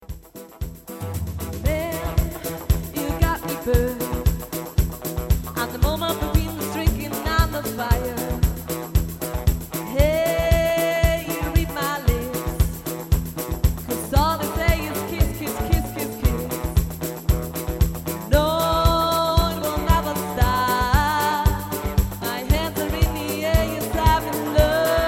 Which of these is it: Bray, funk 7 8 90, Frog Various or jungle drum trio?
jungle drum trio